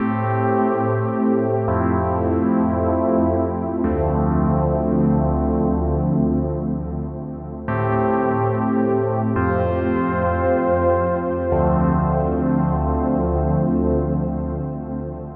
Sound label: Diva